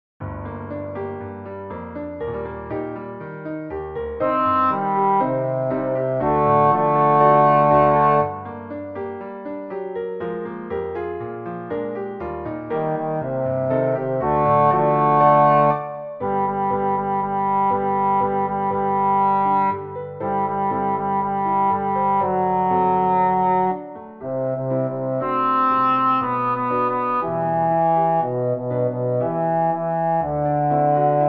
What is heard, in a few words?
bass